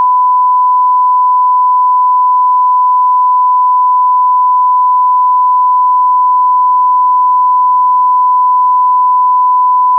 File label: 1kHz